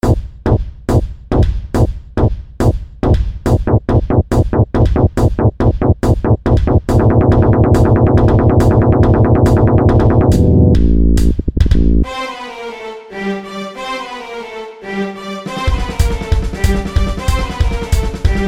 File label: chocolate chords